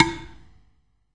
Medium pot SM, Clay Pots, ethnic and world percussion